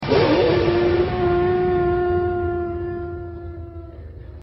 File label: Wolfman